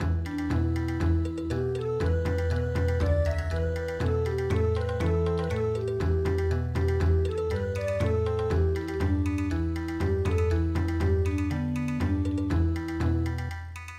Bon Odori

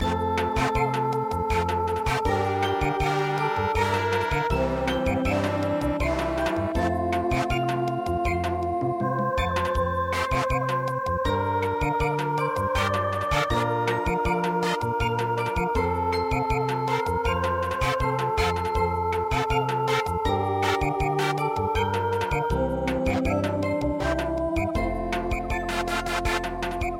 Samba

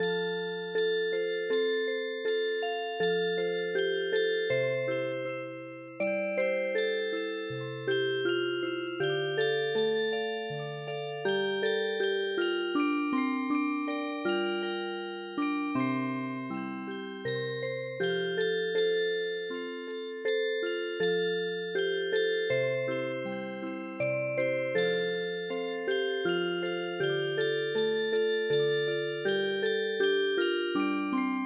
Music Box; Torachi Tarung

